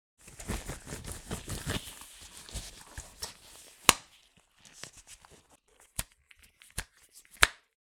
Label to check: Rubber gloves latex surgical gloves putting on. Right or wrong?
Right